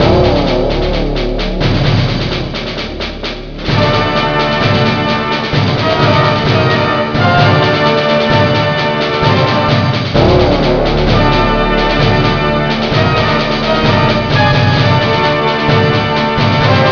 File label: Victory loop; Spec Ops: Rangers Lead the Way; PC-98